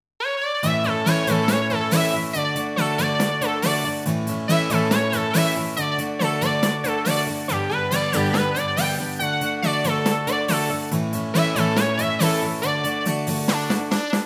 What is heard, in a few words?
Karaoke